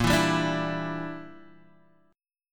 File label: A sharp 6th Flat 5th; A#6b5 strummed; JGuitar